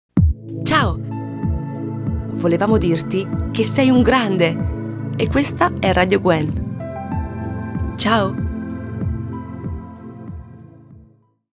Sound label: Jingle